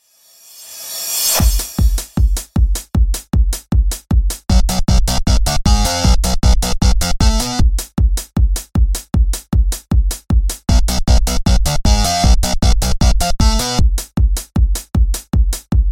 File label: Instrumental